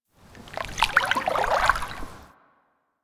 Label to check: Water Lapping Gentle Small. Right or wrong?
Right